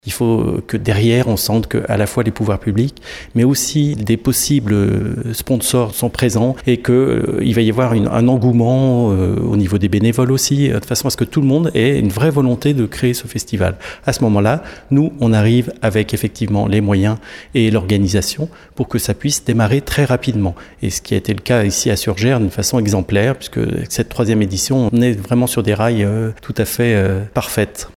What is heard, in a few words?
brass festival